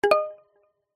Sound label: multimedia alert generic mallet wood